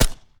silencer; gsh18; STALKER

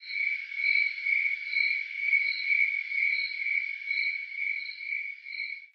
ambient cricket; STALKER

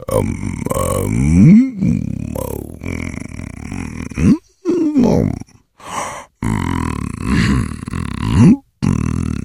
mzombie idle; STALKER; customnpcs

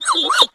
m dog hurt; STALKER

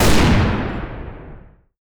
kar98 fire; STALKER

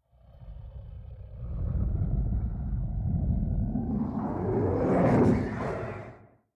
ambient mutant; STALKER

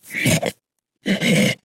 snork make hit; mutants; STALKER; customnpcs

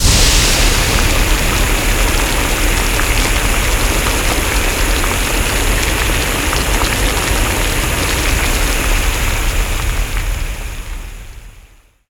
steam active; STALKER; somber anomaly

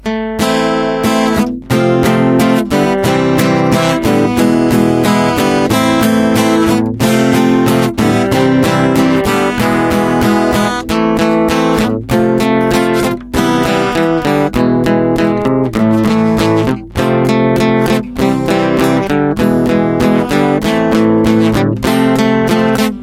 guitar; STALKER

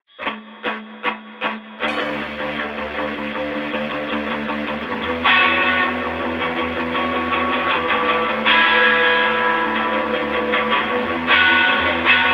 radio music; STALKER

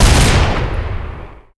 fire; STALKER; starwars; marauder

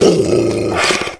dog die; STALKER

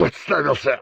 dolg hit; STALKER; customnpcs

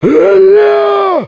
zombie enemy; STALKER; customnpcs

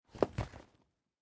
open backpack; STALKER

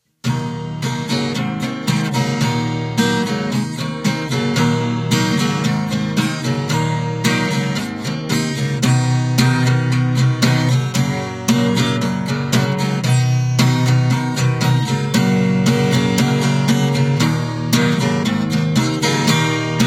guitar; STALKER

